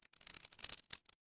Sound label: glass crack